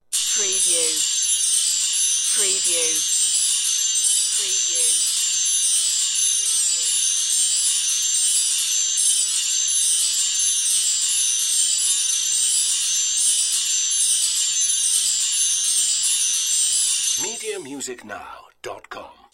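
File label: Windchimes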